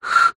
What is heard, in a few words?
office stationery felt tip pen lid air blow whistle